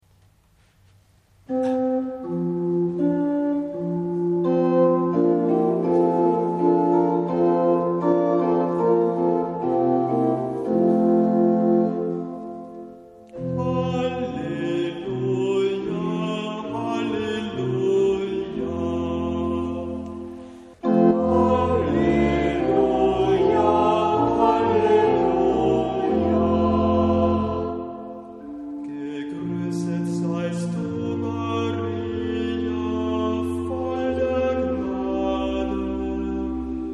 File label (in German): halleluja